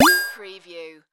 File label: Digital Signal Wa Pling